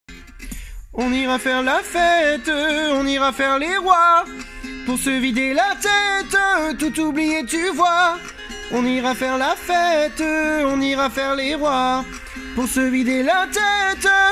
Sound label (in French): Pour Oublier Voix Haute Refrain